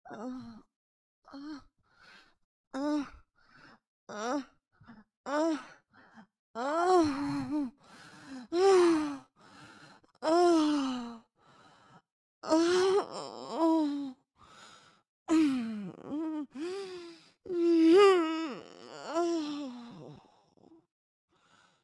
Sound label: moaning; My 20 seconds of moaning